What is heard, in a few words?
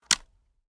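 selector on, weapons foley; gmod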